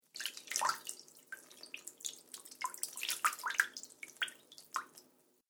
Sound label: Water Trickles And Plops; Dripping Sound Effects